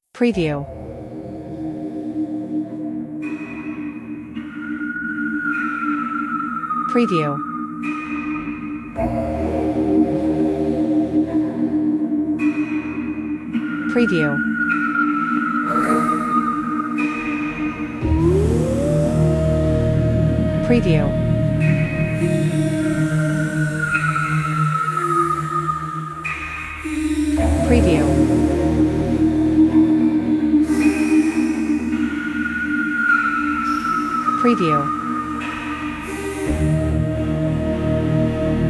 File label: Psycological Horror, creepy ambient music